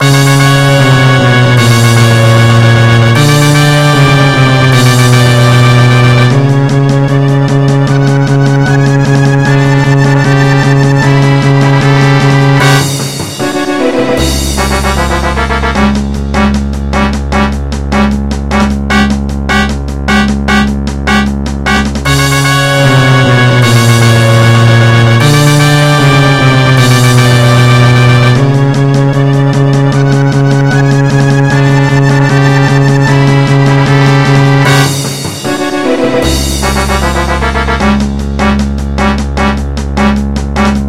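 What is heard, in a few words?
Boss Battle, MIDI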